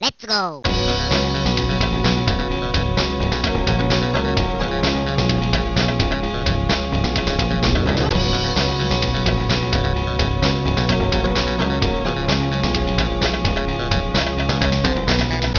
Solo

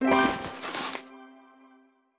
get item

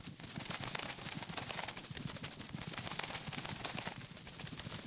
bats loop; adams venture origins

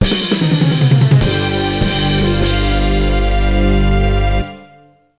midi LOSE; bully scholarship edition; Arc Race MX

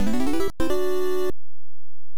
Power-Up Acquired; nes

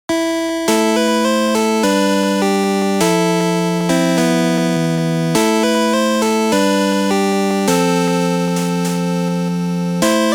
Chapel - Pipe Organ; Kemco; The Sword of Hope

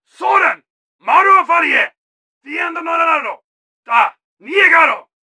warrior Shout

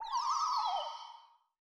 soul loop

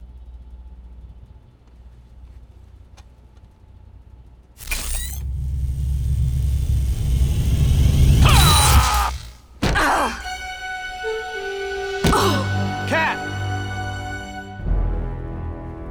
HELLO SHOCK; Spider-Man 2; PS2

